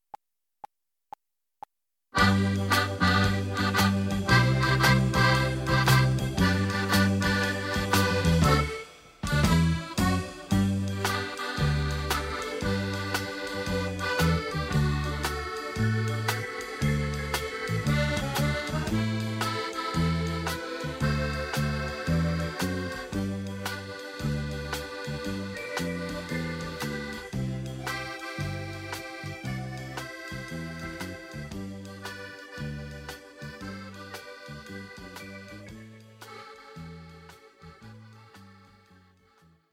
Accordion Medley, G; backing track

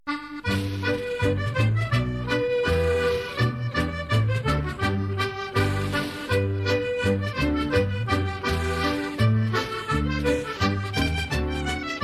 Accordion Polka, G; Bridal Ring

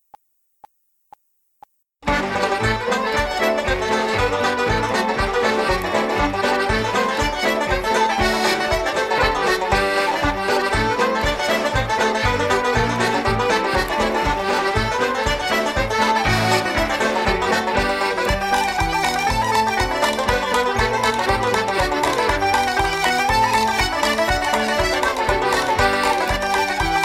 Traditional Irish Reels Medley G; backing track

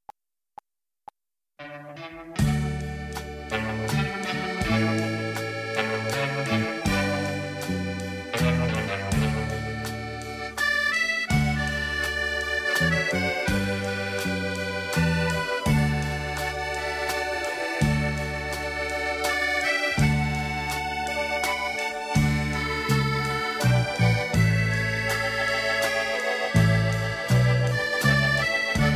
Accordion Medley, D; backing track

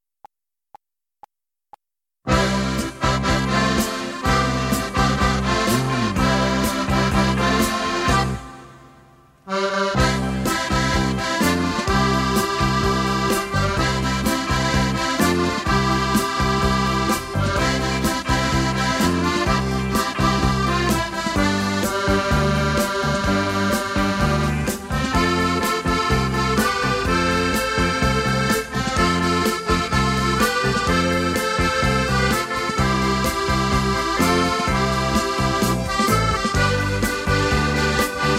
accordion medley

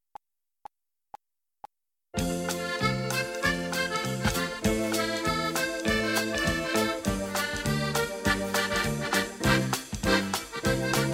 Accordion Medley, F

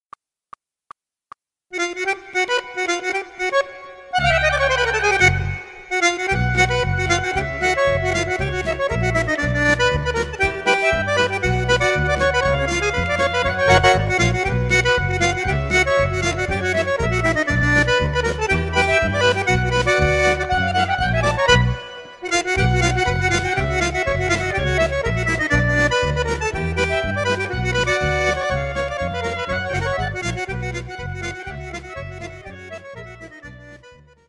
Accordion Tango Medley D; backing track

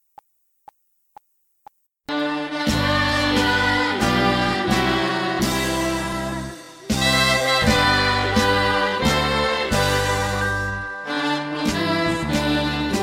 Party Song Happy Birthday

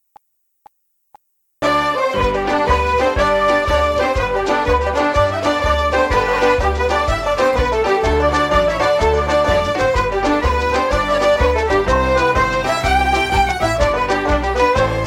Irish Ceili Two Steps G; irish traditional

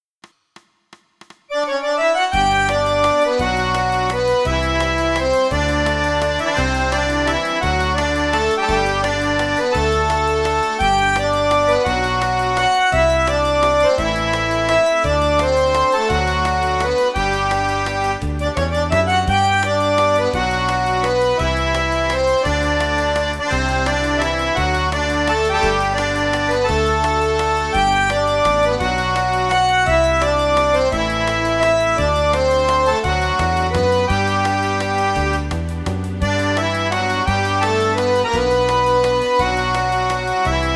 Traditional Irish Waltz G

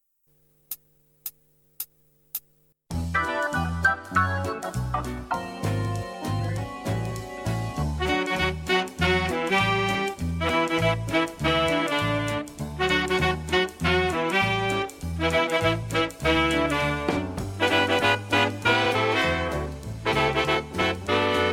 Ballroom 40s Quickstep; D

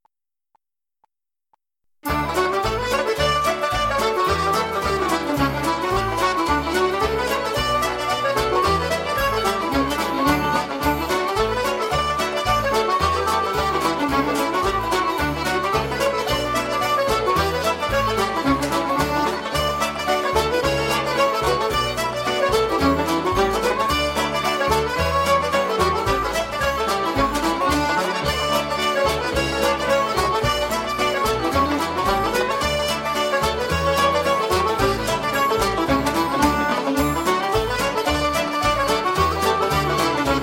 Traditional Irish Reel D; backing track

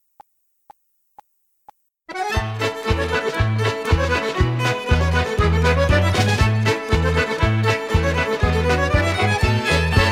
Irish Ceili Reels Medley; backing track

